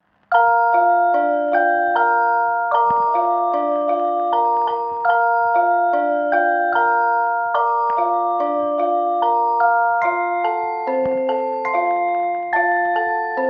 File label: Silent Night Music Box; Silent Night (1940s Music Box); Instrumental